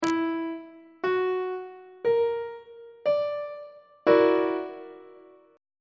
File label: f sharp augmented 7th 3rd inversion; on treble clef